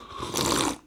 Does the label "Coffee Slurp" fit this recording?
Yes